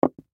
Drink Set Down Poker Table Felt; Set Downs; Foley; SoundEffects-Blastwave

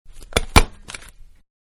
Stapler Manual; Staplers; Offices; Manhattan Production Music

